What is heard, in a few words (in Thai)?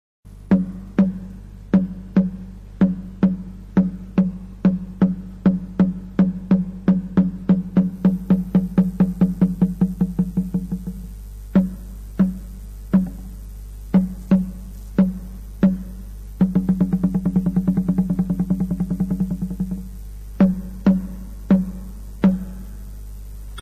เสียง ทับ